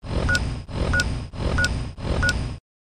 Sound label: Alarm; Pipboy